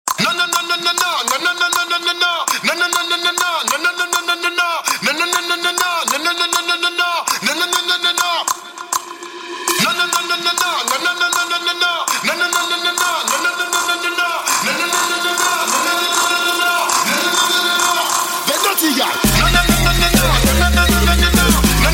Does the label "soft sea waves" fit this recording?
No